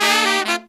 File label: Pop & Funk Brass; Best Service ProSamples vol.25; AKAI